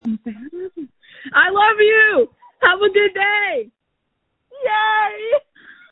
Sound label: screams